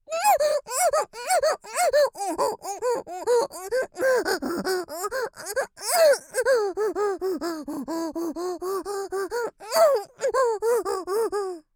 おっ！♥おっ！♥おっ！♥おっ！♥

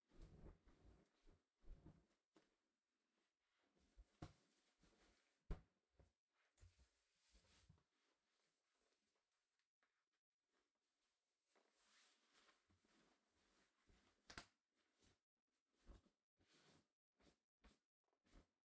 フレアスカートを履く; KU100; 色々な動作

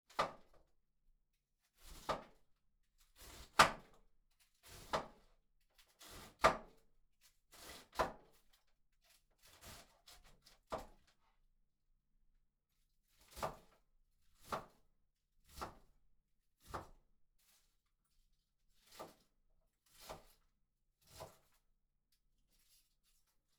切る キャベツ; KU100

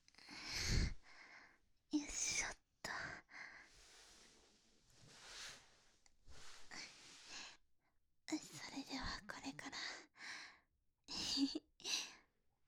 耳元 オナニー バイノーラル, ASMR

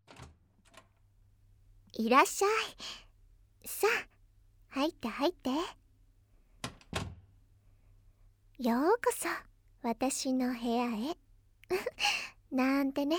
耳かき・とろける飴舐めで甘やかしたいの, ASMR

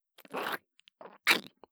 くちゅくちゅ, 効果音

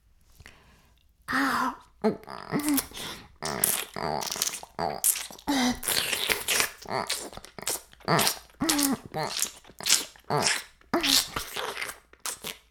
フェラ音声, ASMR; 白くて黒い魔性円環

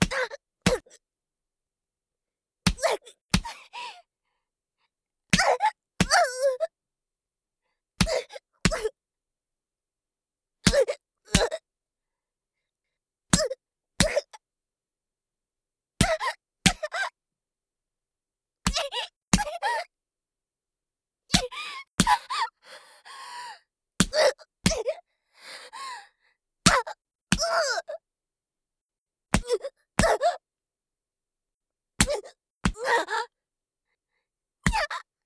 ジャブ BPM90 ワンツー; 効果音あり

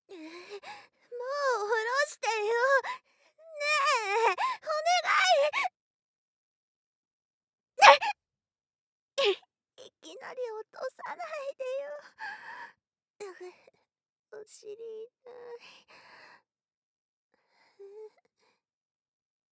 イラマチオ ボイスのみ, ASMR; ゴブリンイラマチオ

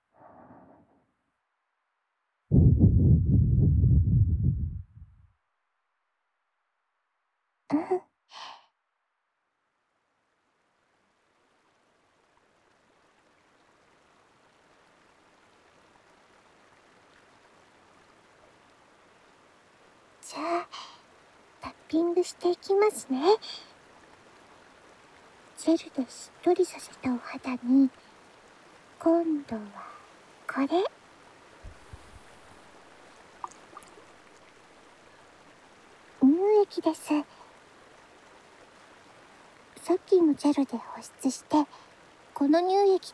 乳液ソフトタッピング, ASMR

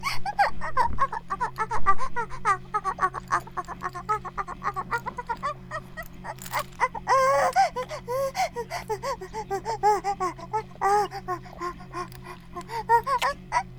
バイブ放置; イクたびにわけて

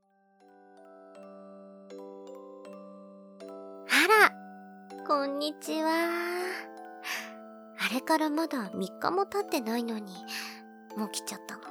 オルゴール ver., ASMR; くまさんコース

